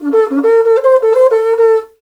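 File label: Afro Horn Phrase; Roland LCDP11 Africa VOL-1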